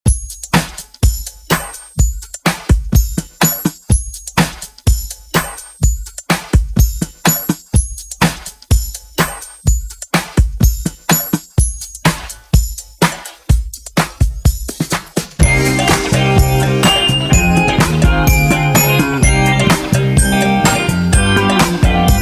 Funk Intro Instru Outro 125 Bpm

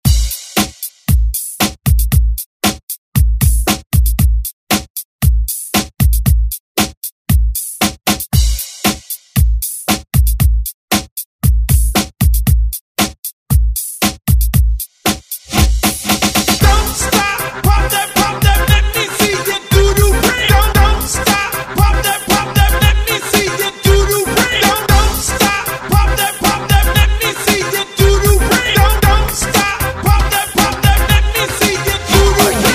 Top40 Hype Redrum

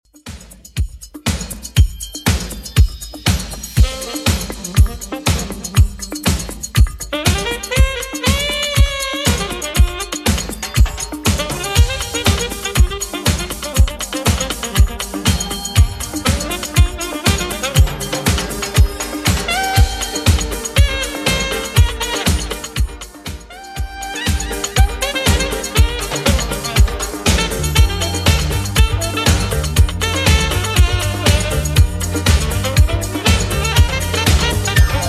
80s Redrum 120bpm

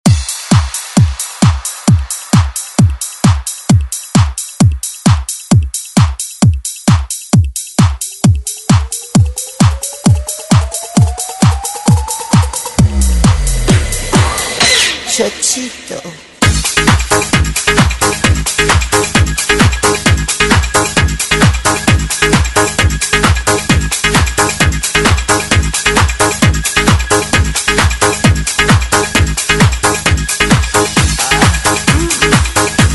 90s ReDrum; DJ Mhark

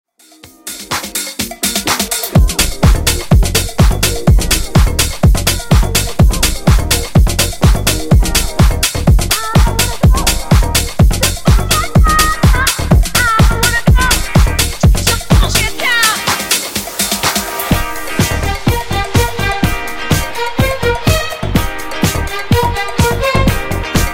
Funk 125 Bpm

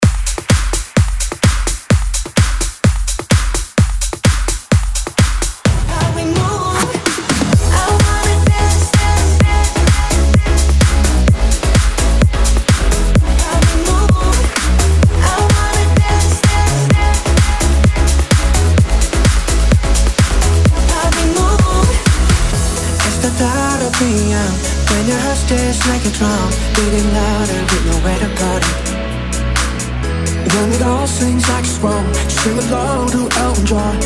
128 bpm

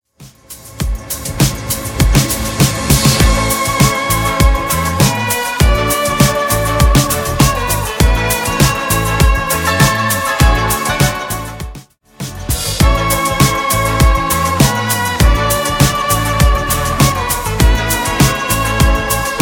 Re Drum Rebeat 100bpm

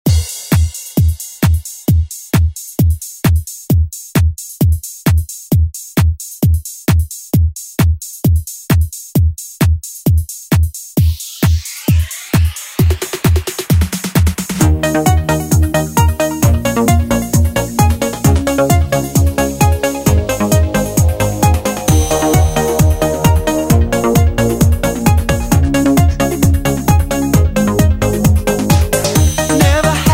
ReDrum; remixplanet

